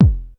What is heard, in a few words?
KICK, Hip Hop